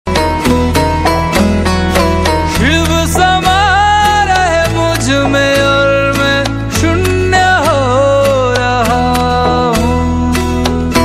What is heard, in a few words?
Bhakti Song